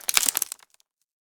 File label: branch crack; 摇钱树; TREE